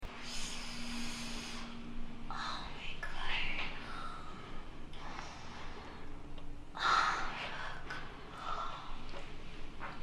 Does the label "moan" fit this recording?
Yes